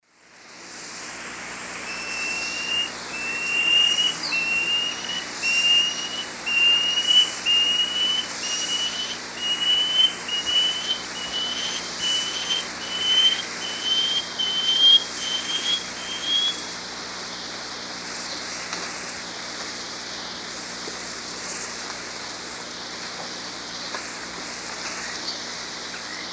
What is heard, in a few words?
Dichrozona cincta, song; S. America; Eastern Lowlands